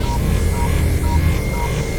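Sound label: Ambience Sci-fi Energy Loop; SFX Kit; Sci-Fi Loops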